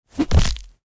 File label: body impact; Memes